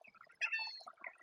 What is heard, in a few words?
Chirp